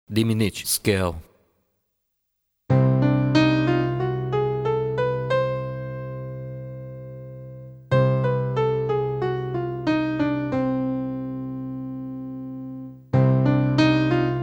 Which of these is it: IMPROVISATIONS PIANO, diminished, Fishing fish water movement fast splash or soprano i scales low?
diminished